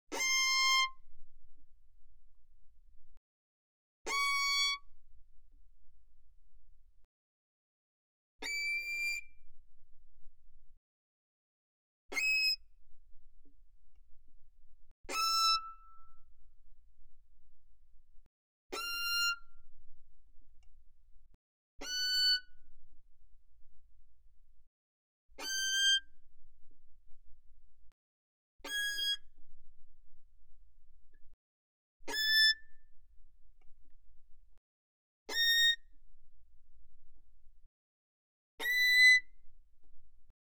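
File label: Violin arco mezzo forte sul E C6 B6; Strings; violin2012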